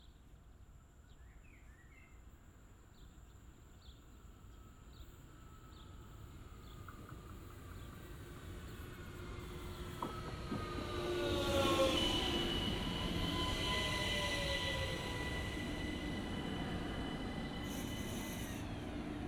Zug

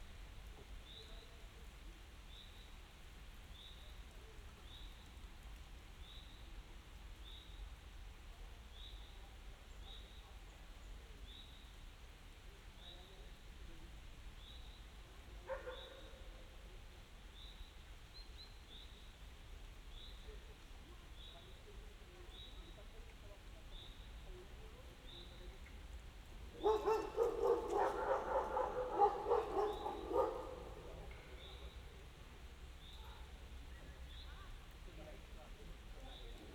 Regen; Lexikon-Roman

